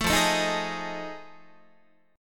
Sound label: F 7th Sharp 9th Guitar, chord; F7#9 strummed; 12,13,12,13,12,14